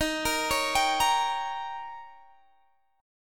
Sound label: D sharp 7th D sharp, Guitar Half Step Down